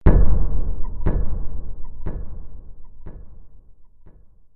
elevator shaft tunnel

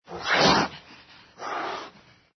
ANIMAL CAT

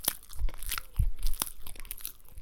eating effects

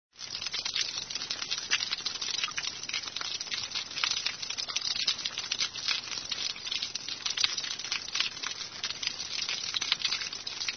Rain Splats

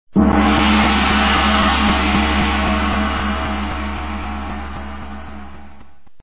Gong

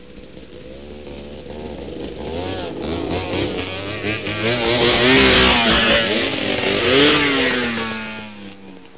vehicle

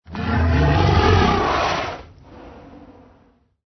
ANIMAL DINOSAUR

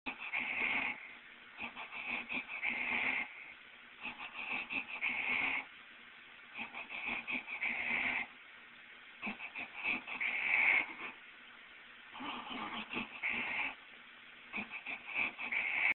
breathing; resident evil regenerator

